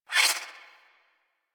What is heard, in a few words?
Swing, Melee; Balance Four; Warframe; Weapons; Modular Melee